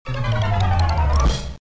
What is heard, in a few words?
cartoon